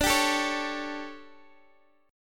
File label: D Major 7th Suspended 4th, chord